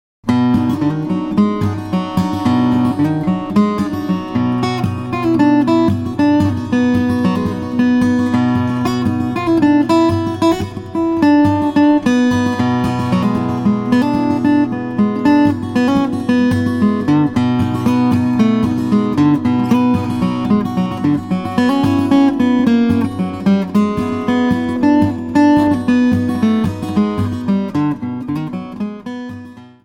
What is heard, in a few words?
Old Mountain Blues, guitar